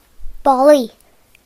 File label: sniff; gcompris-data